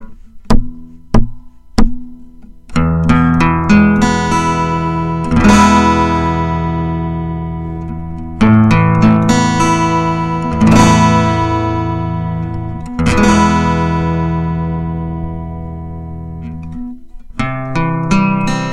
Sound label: piezo, acpickup; ピエゾ